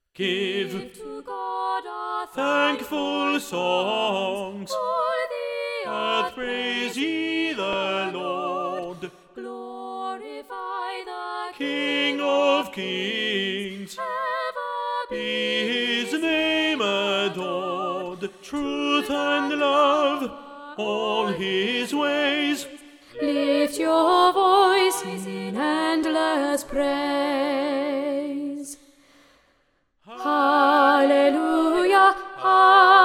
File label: Tenor Up, Give to God our thankful songs